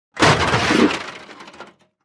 padenie neakkuratno slozhennih veshej

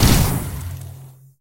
megamoshnij vistrel iz lazernoj pushki

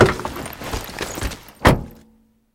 nespokojnij suetlivij grohot padayushih veshej

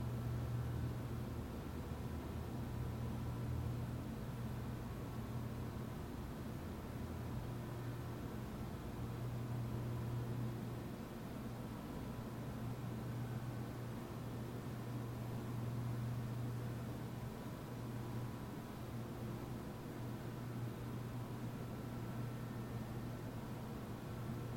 beskonechnij gluhoj zvuk raboti proizvodstva

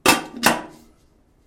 metall dvojnoj udar

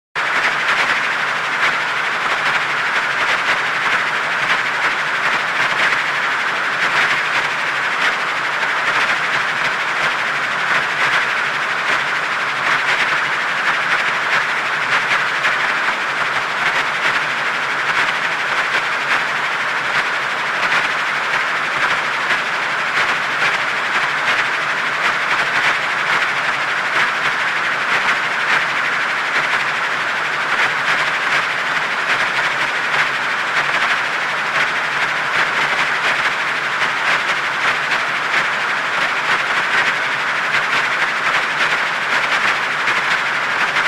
analogovij sintezator arp odyssey

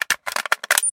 razborka zaklinivshego blastera

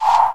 knopka cifrovoi shumnii blizkii korotkii

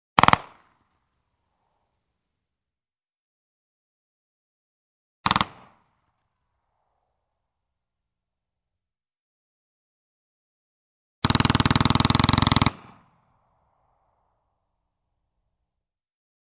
zvuki vistrelov iz pulemeta mas-11

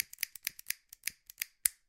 povtoryayushiesya mnogokratnie shelchki

